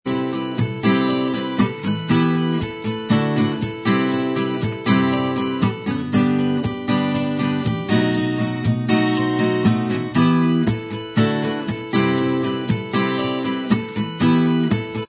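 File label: acoustic version instrumental, backing track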